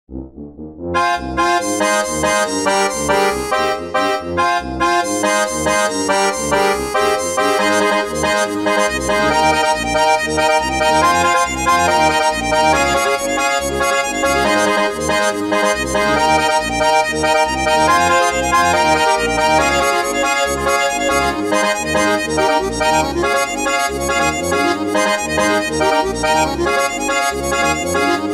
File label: polka remake; Avgn